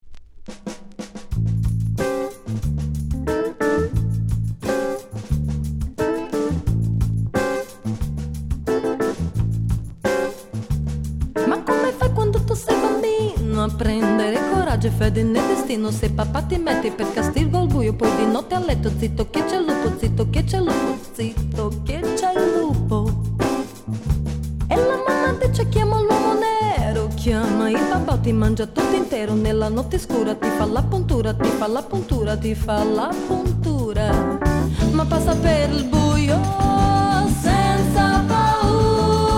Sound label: quintet